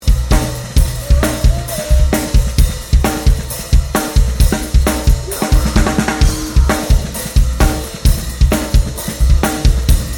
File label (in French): snare, la grosse caisse et l'ajout des Overhead; batterie; une plate courte mais très claire à la TC Electronics sur le micro dessous; plug-in de Brainworx